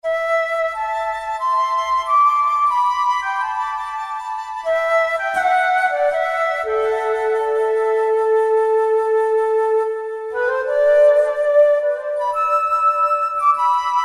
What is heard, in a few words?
Legato, Wind Instruments; Yamaha A4000; Brass - Wind Instruments